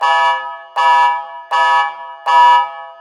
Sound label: Alarm, Evacuation, Malfunction, Large, Danger; Blind Drive